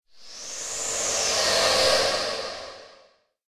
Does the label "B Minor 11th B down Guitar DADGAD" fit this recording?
No